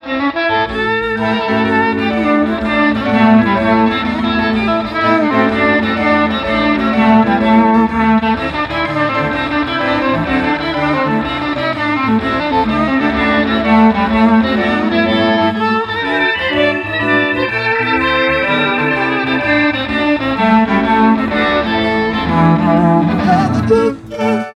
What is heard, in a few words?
harmonic